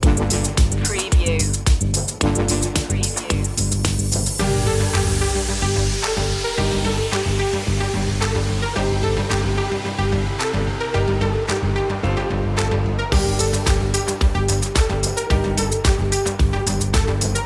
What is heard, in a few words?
corporate machine; media music now